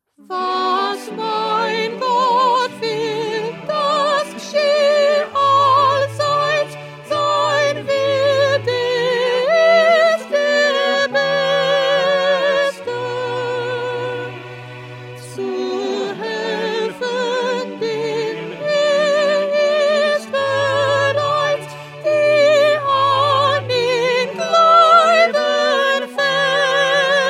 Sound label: Soprano